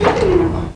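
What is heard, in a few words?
ambience; INC demo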